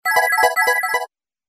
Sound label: Serene tone; Samsung; SGH-F400